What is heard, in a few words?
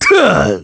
Victory; Ganondorf